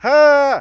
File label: fear